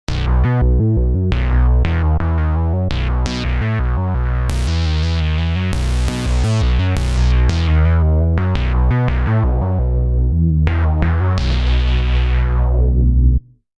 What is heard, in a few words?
Bass, v-synth; Bootsie